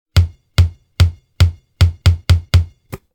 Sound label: basket